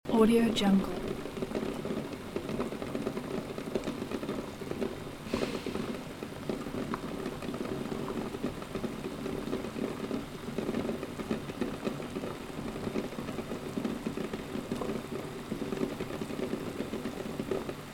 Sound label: boiling sausages and eggs loop; domestic sounds